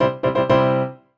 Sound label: Victory, piano; lila